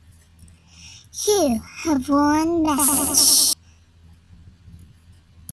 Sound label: Message Tone